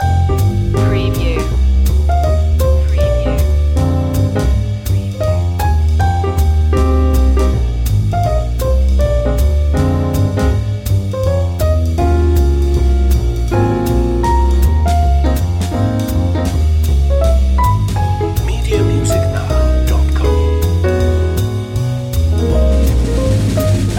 in paris loop

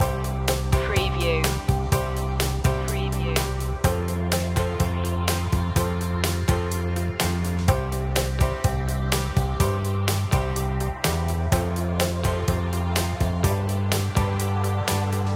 bright inspiring loop

